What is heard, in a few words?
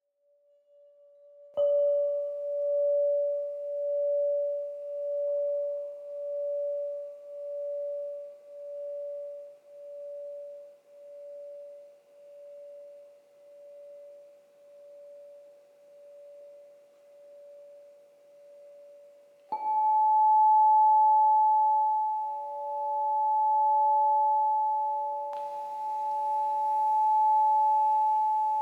Sun D harp, crystal harp